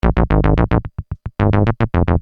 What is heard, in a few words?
Synth Bass 110 bpm A#; Sonic Foundry (Sony Creative Software); Techno Synth Loops 1 Signals I